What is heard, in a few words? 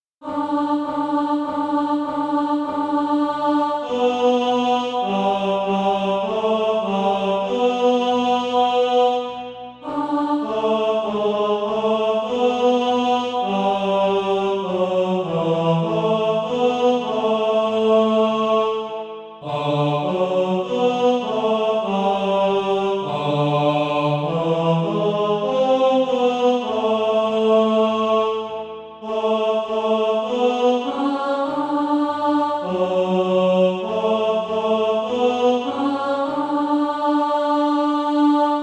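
bass, Sheet music (SATB) including Practise Music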